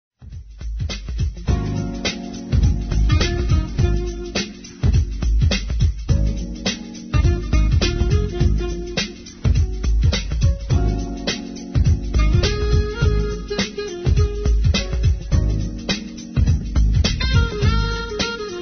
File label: jazz pop